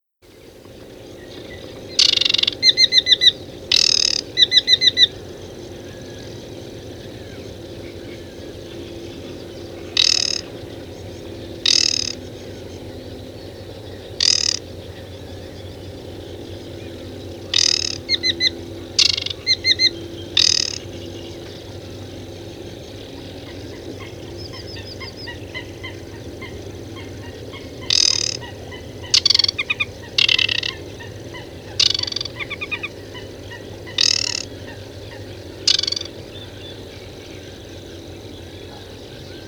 Aquatic Warbler, birdsong

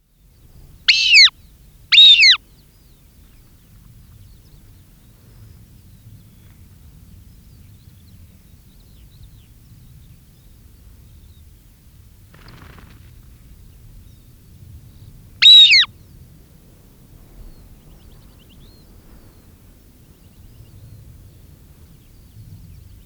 Hen Harrier, birdsong

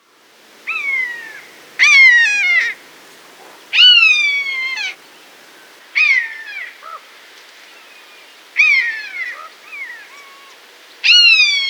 Buzzard

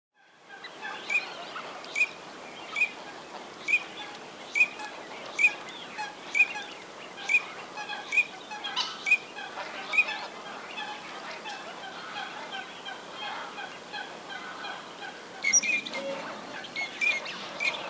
Teal, birdsong

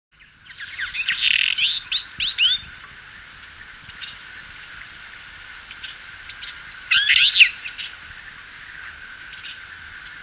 Twite, birdsong

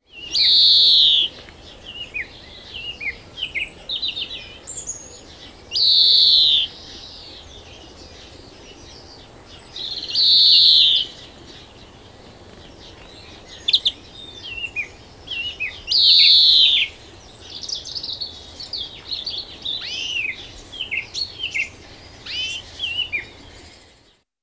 Greenfinch, birdsong

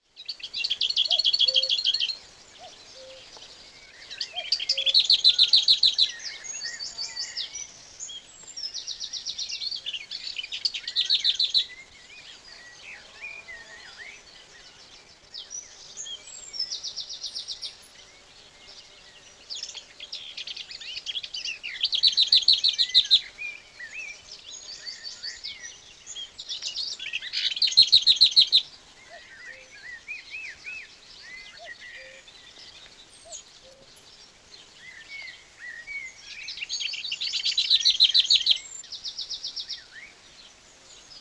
Lesser Whitethroat

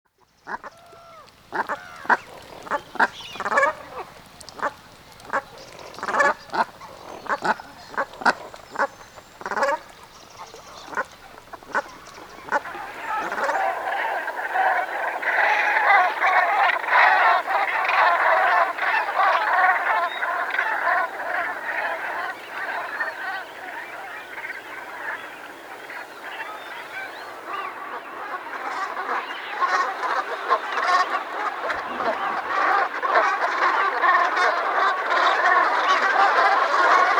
Brent Goose

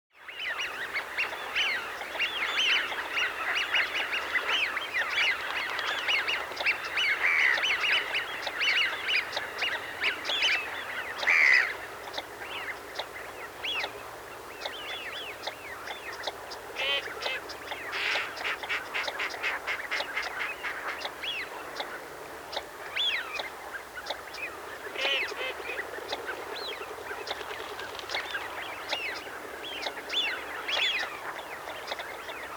Shoveler

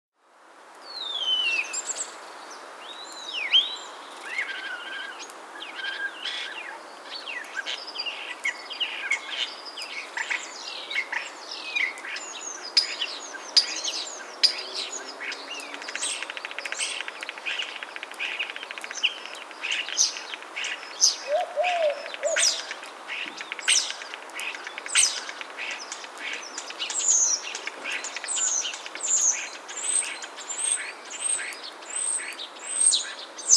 Starling

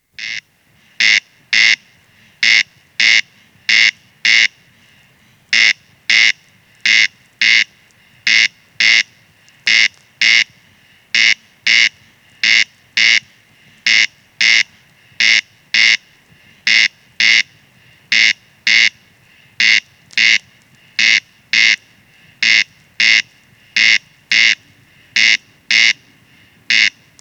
Corncrake, birdsong

